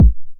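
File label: 909 BD tape, kick drum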